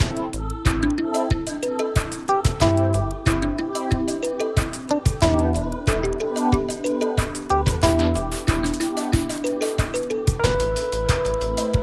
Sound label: lounge loop